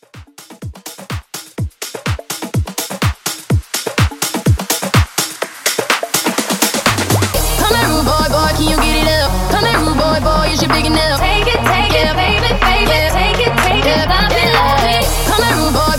House Mashup; Dj Bose

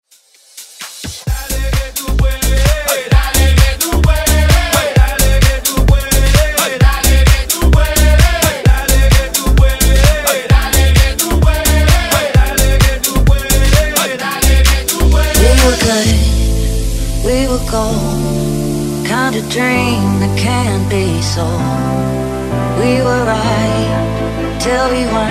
House Redrum

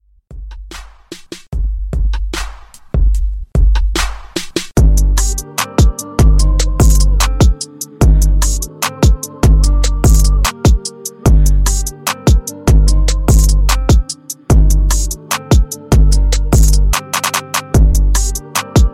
Latin Redrum; Remixfreakz

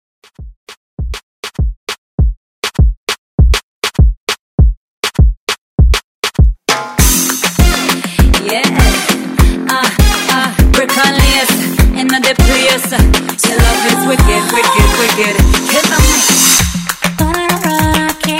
Afrobeat Mix 100 Bpm Clean

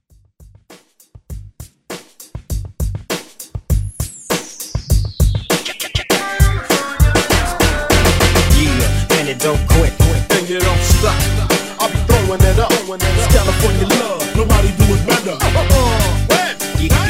Old School Mash Up

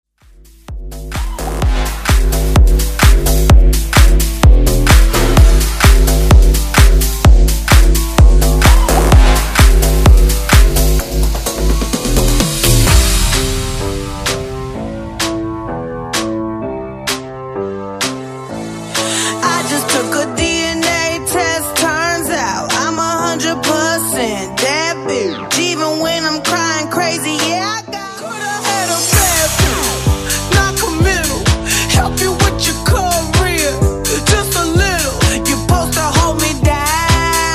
Bass House Banger

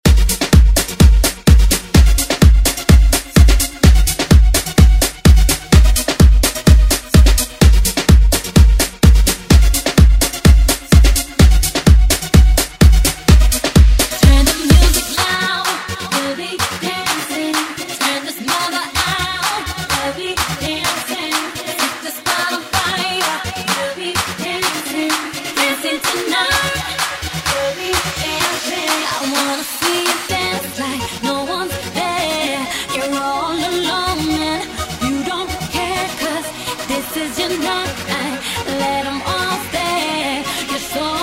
Afrobeat Starter

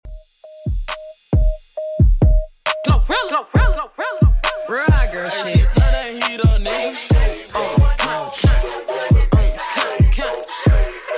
Top40 Xtend Redrum 135 bpm

